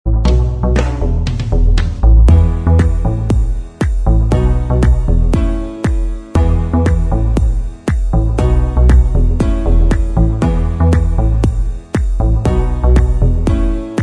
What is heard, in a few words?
Inst